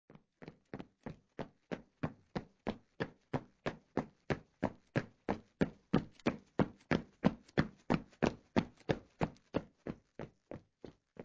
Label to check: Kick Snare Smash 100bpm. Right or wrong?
Wrong